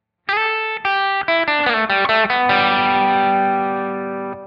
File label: E blues, guitar